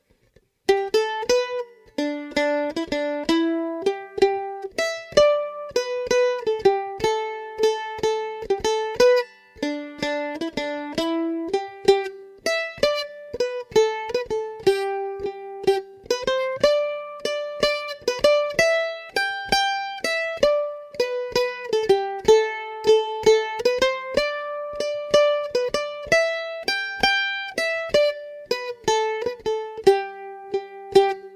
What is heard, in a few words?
Drum